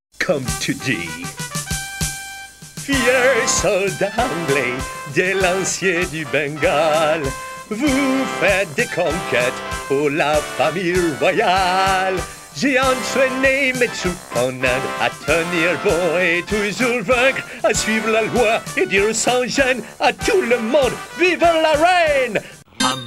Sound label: cartoon; jeunesse